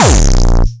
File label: bassdrums, techno; Best Service ProSamples vol.45; Techno ID; single-drums